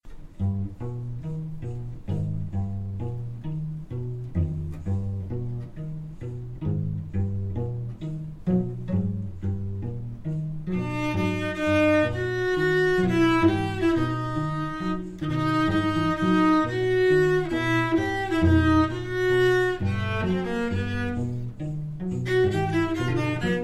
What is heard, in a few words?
Cello Choir Etude